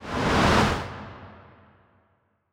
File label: kaiju hatchling tail swish high; biogenesis